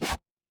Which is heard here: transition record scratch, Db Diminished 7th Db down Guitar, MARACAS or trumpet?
transition record scratch